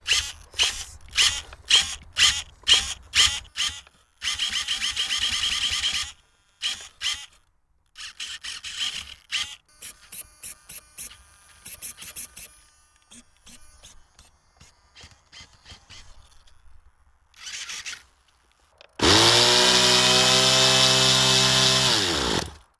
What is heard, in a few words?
RC Heli Motor Engine, rc helicopter